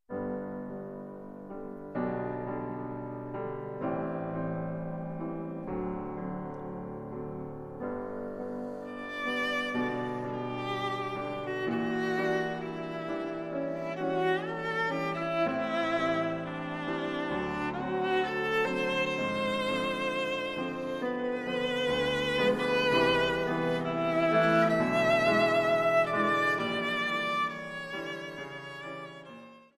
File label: Romanza for viola and piano